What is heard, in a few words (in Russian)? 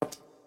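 лёгкий стук человеческого шага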